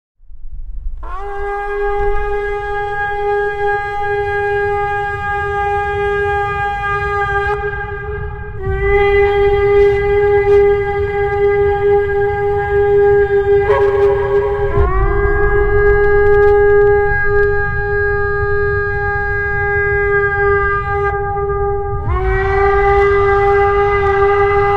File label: Trumpet Sound